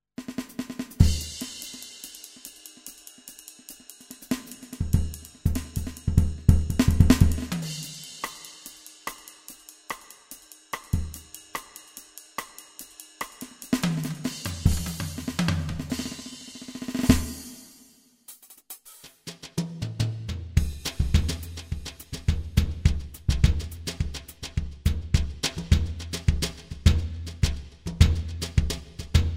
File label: jazz drums